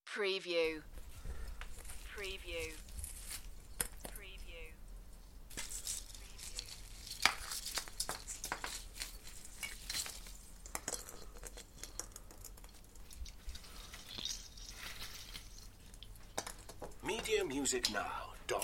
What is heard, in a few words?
Artificial Christmas tree being decorated; beanstalk audio